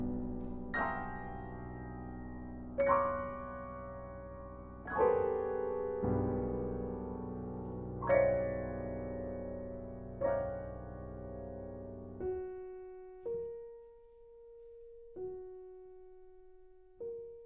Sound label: Ballad